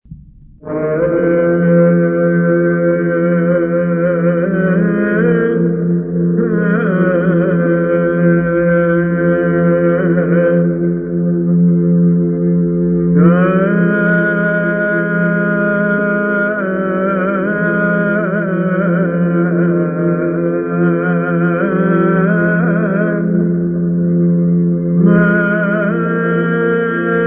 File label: Music; apocalypse